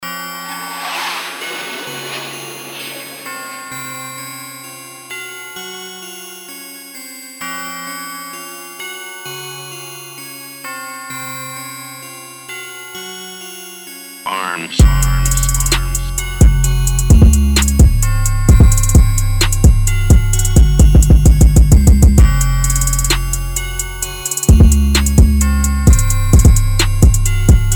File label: Instrumental